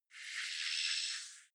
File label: sci-fi hologram message high noise; energie